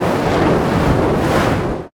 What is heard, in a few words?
damage hits explosions, Warships